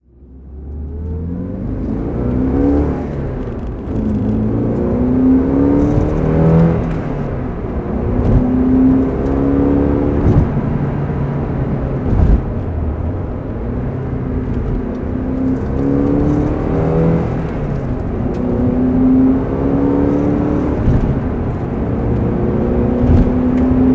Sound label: porsche 968 cs driving